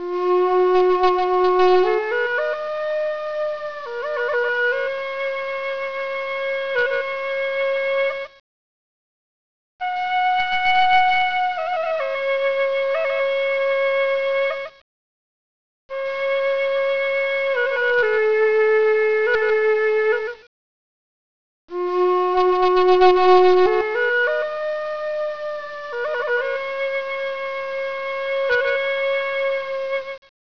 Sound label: Native Flute; RealTunes